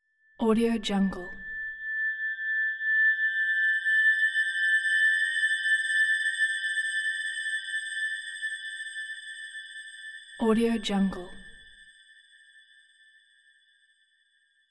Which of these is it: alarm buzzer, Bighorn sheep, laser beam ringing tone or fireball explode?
laser beam ringing tone